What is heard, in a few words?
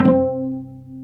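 strings cello pizzicato, dry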